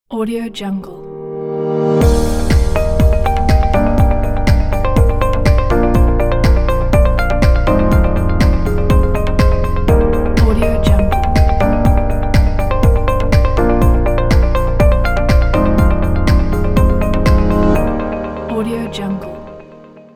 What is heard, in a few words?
success logo